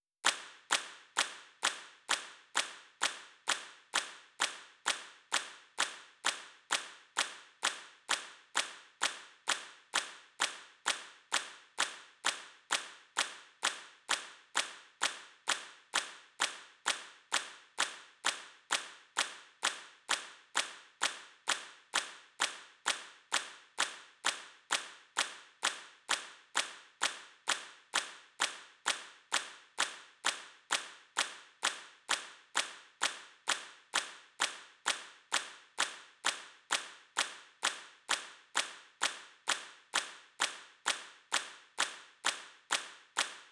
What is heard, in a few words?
Hand Clap